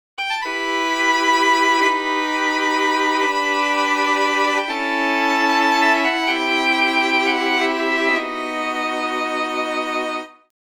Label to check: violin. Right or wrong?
Right